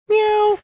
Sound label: meowing